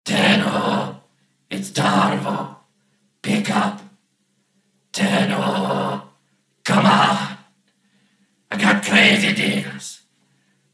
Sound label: Incoming